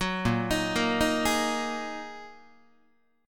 A sharp 7th Suspended 4th Sharp 5th A sharp, Guitar; arpeggiated; chord; JGuitar